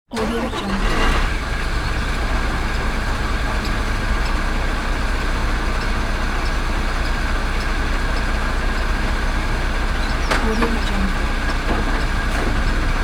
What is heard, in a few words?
noisy truck starting